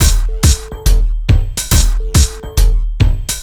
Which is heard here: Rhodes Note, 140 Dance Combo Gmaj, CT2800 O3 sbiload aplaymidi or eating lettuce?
140 Dance Combo Gmaj